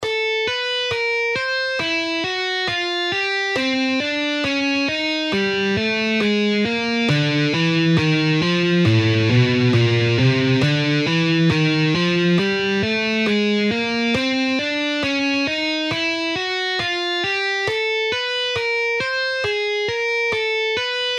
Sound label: guitar finger exercises